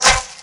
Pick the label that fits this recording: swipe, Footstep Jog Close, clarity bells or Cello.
swipe